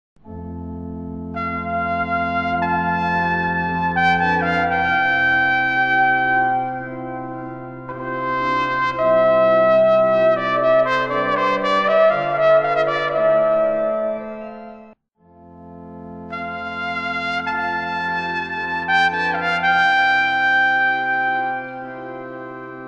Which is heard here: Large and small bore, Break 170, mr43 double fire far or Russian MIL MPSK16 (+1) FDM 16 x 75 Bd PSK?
Large and small bore